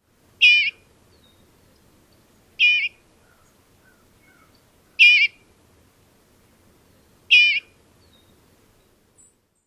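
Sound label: Eastern Bluebird turalee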